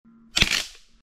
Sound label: small twig crack